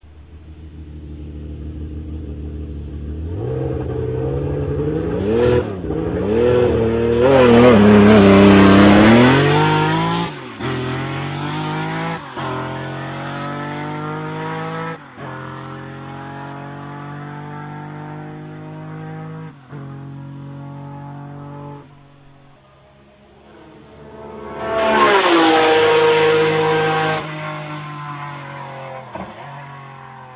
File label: Ferrari 512s, motorhangok; autosport